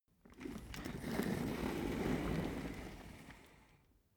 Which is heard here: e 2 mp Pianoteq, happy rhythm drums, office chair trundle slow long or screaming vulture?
office chair trundle slow long